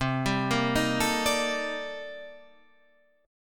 C-Minor 13th-C-down, guitar; arpeggiated; JGuitar

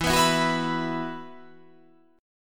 E Suspended 2nd

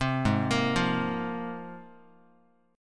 Ab 6th Add 9th Ab, Guitar; arpeggiated; JGuitar

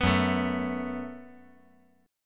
Gb Minor Double Flat 5th

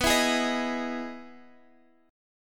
E Major 7th B, chord

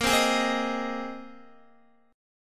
A sharp 7th Suspended 2nd Suspended 4th, Guitar; strummed; chordshape; x,13,10,13,12,10

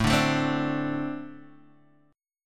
A Minor Double Flat 5th, Guitar, DADGAD

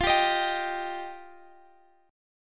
F 7th Suspended 2nd F down Guitar Half Step Down

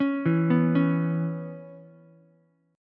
Db Minor 7th Db down Ukulele; arpeggiated; JGuitar

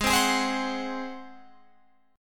Ab 7th Ab down Guitar Open F; strummed

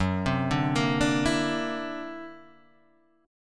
D Augmented 9th F sharp, guitar

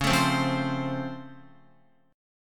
Db Augmented Major 7th, down, Guitar; strummed; JGuitar

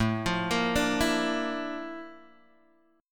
Bb Major Sharp 11th A, chord

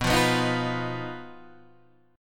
B Major Flat 5th B, chord; BMb5 Chord {7 8 9 8 6 7} strummed

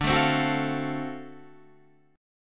D 7th Sharp 9th, Guitar Half Step Down

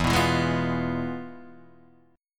F Minor Major 7th Flat 5th, E

